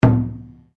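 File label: Drum Taiko forte, Japanese Taiko Drum; Miscellaneous Musical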